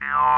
gun stand

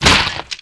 megalodon die

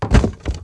ragged zombie die

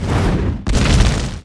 wizard meteo

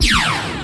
poton canon attack

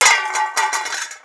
antique firelock die

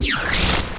cannon; spirit handler; SH HYUN ROK

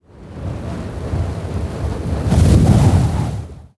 field wind

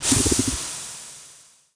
gas push

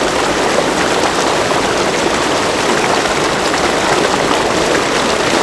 subterranean waterfall

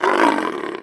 turtle damage; turtle general

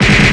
PSYCHIC STREAM hit

